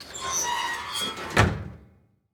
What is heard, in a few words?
Household Oven Open